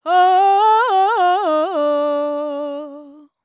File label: World Voices, INDIA; ILIO; Vocal Planet